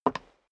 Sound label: step dress boots wood creak single left; schritte; Footsteps; menschen